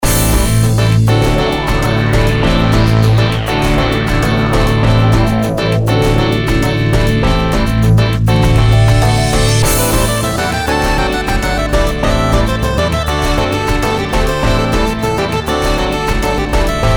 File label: bgm